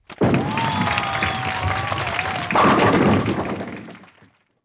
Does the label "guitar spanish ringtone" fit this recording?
No